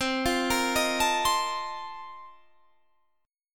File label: A sharp Major 7th Suspended 2nd Suspended 4th C